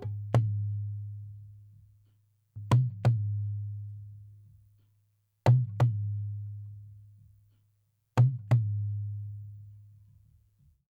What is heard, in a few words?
dholak 088; 088bpm; Hip Hop India Modern Percussion